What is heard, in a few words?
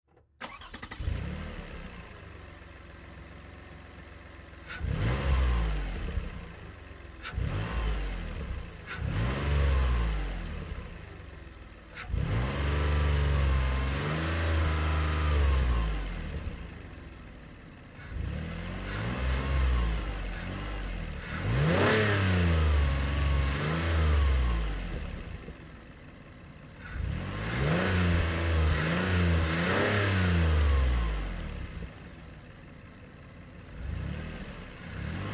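Car Highlander Outboard Revs Light; 2005 Toyota Highlander V6; Onboard; Vehicles; Car Highlander Ob Revs Light; Cars; Big Room Sound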